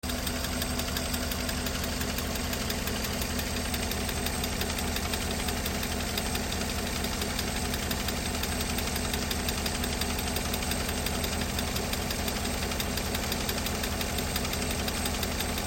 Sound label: engine tick truck